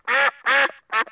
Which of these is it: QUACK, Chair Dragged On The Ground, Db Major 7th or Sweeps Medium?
QUACK